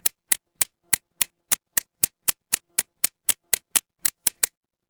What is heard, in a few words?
office pen